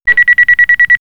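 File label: Incoming, electronic fx; X-Static Goldmine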